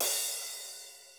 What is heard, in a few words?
cymbal, Roland MC-909